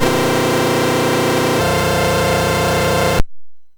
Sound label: Chords, MOS6581R2